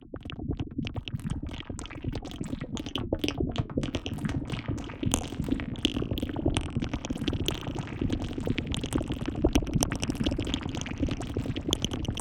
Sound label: Crackling